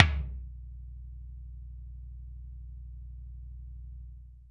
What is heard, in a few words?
tom, Roland MC-909